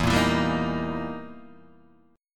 E Minor Major 7th Flat 5th, E, down, Guitar, Open D